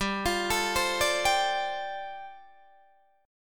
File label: G 9th Suspended 4th, Guitar DADGAD